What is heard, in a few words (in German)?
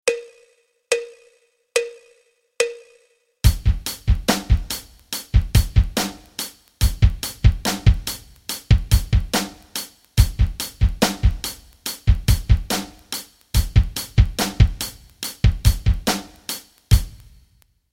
rock rhythmen